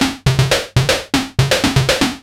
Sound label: SH101 LOOPS; Syntec; Wall of Sounds; TECHNO-BOX